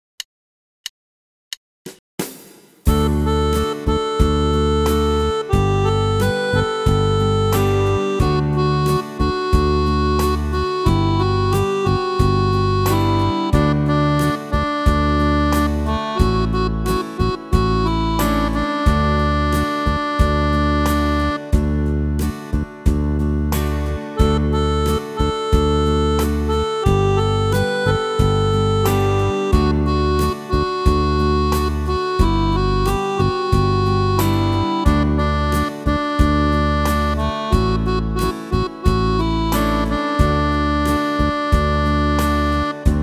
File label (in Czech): doprovod D dur s melodii